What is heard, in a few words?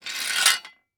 Industry Tool Shovel Small Metal Shovel Scraping Cement